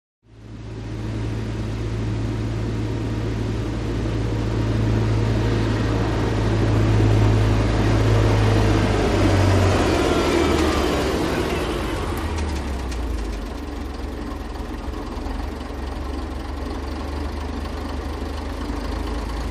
Earth Mover; Earth Mover Up, Stop And Idles. Left To Right